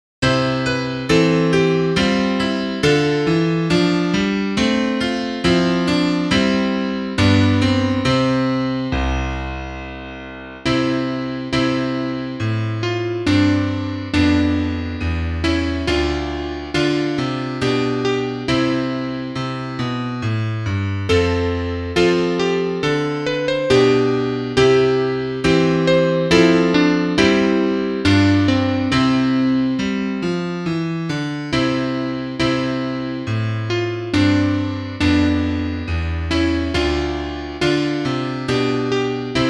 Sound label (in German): Klavier Solo, trompete; Arioso